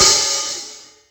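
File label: HH open, Hi-Hat, Yamaha DTXpress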